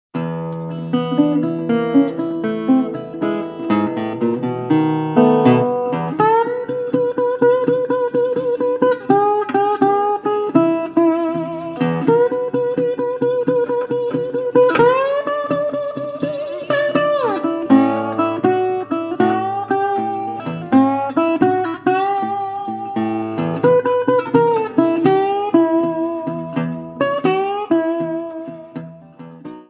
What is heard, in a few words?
Bottleneck Slow Blues In E